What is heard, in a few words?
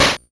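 Game Boy Perc, DRUMS; ChipShop Deluxe; ChipShop Hits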